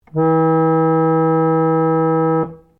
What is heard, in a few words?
ORGAN PUMP PRINCIPLE E3, Old portable church organ; Keyboards; Musical